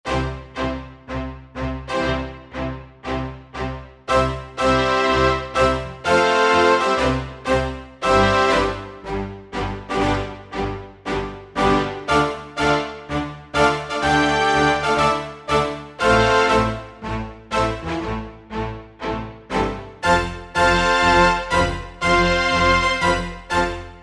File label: Orchestra